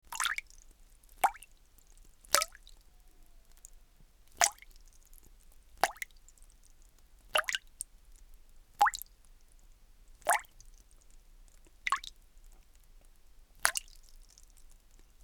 Bathtub Drip

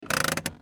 Auto Parking Brake On

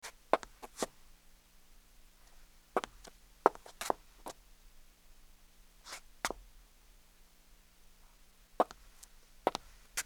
Footsteps Heels Concrete Scuff

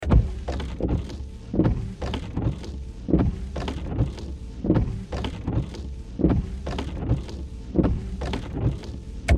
Auto Wipers

